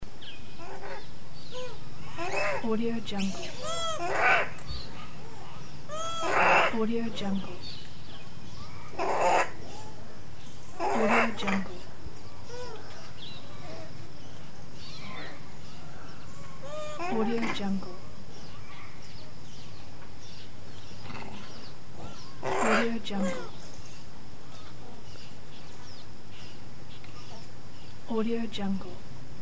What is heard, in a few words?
monkey jungle ambience loop